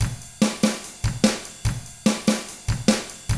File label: rock drums